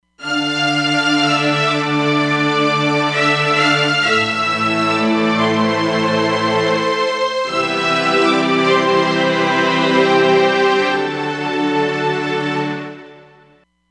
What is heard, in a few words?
Oct Strings, Roland Fantom XR